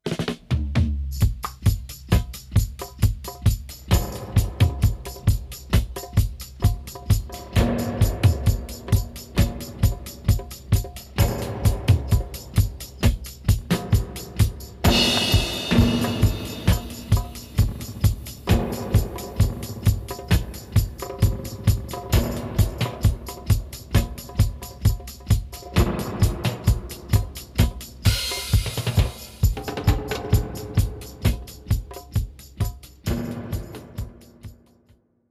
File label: REVERB dub drum